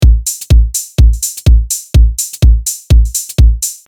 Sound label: deep h loop